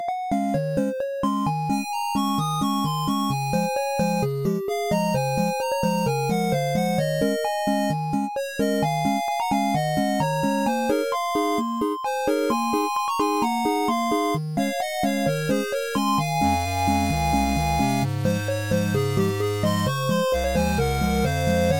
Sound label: 8bit